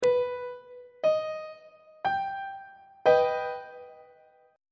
b augmented chord on treble clef

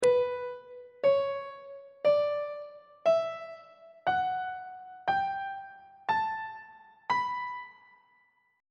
b aeolian mode note positions; on treble clef

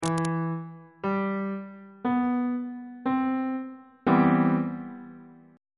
b sharp major 7th 1st inversion on bass clef

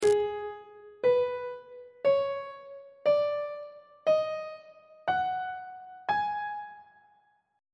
g sharp blues scale on treble clef

